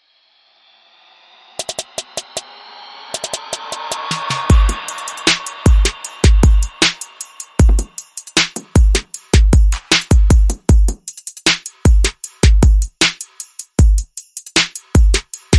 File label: TRAP Beat